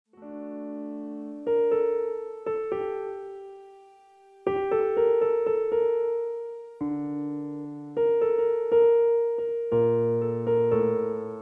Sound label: Piano